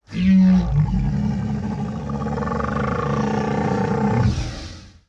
Dinosaur Design, Vocals - Monster

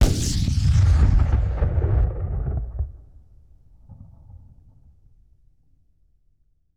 Cannon Blast Downrange Fire; Period Combat; Sony Pictures Sound Effects Series

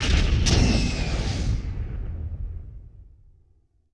WWII Explosion With Flare; Period Combat; Sony Pictures Sound Effects Series

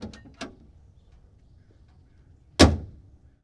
Ford Stake Bed Truck Door Open Close; Sony Pictures Sound Effects Series; Period Vehicles

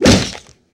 Swipe With Bone Crack, Hand To Hand Combat; Sony Pictures Sound Effects Series

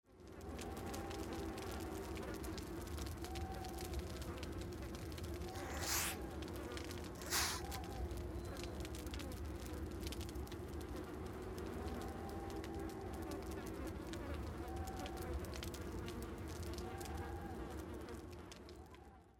Ambience; Microsoft Studios; Age of Empires III - Complete Collection; cinematics

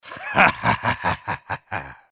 Laugh; Age of Empires III - Complete Collection; Microsoft Studios

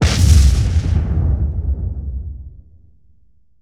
Explosion Single Large; Sony Pictures Sound Effects Series

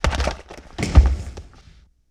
Fight Sequence, Hand To Hand Combat; Sony Pictures Sound Effects Series

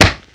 Kung Fu Old School Hit, Hand To Hand Combat; Sony Pictures Sound Effects Series

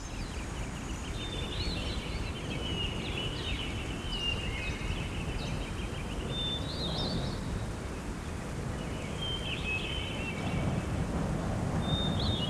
peace bird; RYL 2

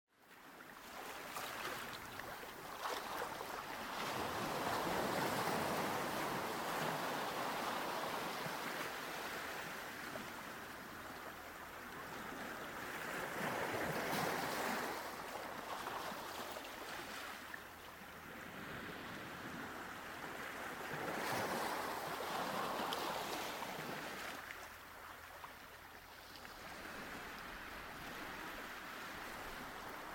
Water; Age of Empires III; cinematics

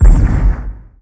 firing; RYL 2

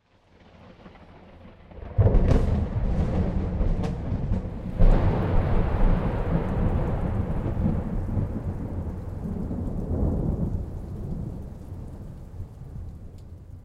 Thunder; Microsoft Studios; Age of Empires III - Complete Collection; cinematics

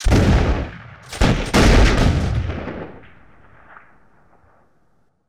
Musket Scattered Shots, Period Combat; Sony Pictures Sound Effects Series

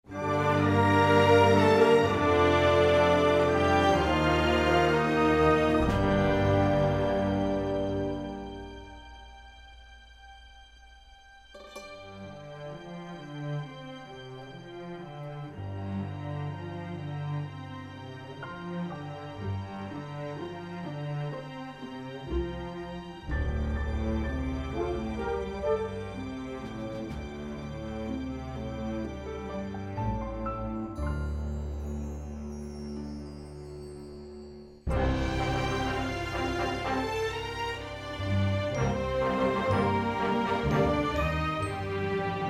Music; Microsoft Studios; Age of Empires III - Complete Collection; cinematics

